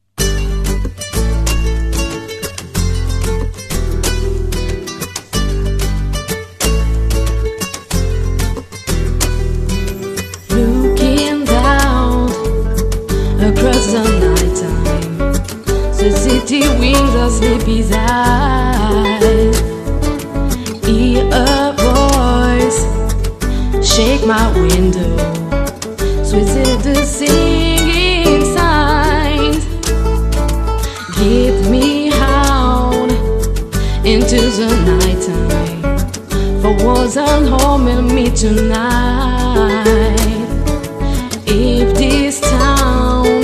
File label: Acoustic